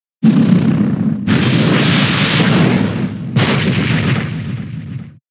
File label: Fire Blast; Pokemon; gen 6; XY; ORAS